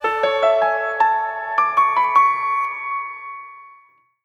Logo Variant

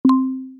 UI voice listen; AMAZON

